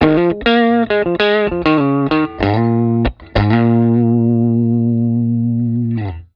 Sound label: LP MIDDLE LICK, humbucker pickup; patent denied humbucker pickup; SG